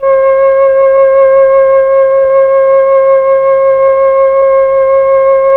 FLT, Melotron Flt, Tron Flutes

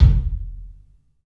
KIK, LIVE CLUB KK, Drums; 3D Sound

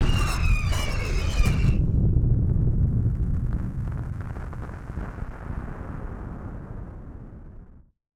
Firework FX; musicradar; bomb factory

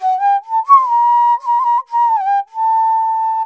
INDIA ALTO

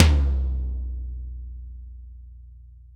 TOM-TOM-L, ACOUSTIC KIT; Greytsounds VOL-1

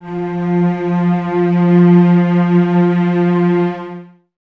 CES F 3, Cellos, String Ensembles; Miroslav Vitous; 90 s Sample CDs

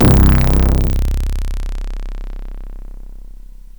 mosbas, Bass; Giga Samples Collection

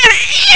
auaahh; medusa; Amiga Soundtracker Sample Packs

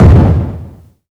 Big Drum OS; Sonic Foundry (Sony Creative Software); Rondo Brothers Trip-Hop Loops & Samples

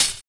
Hhat, Hihat; Equipped Music; Loungin House

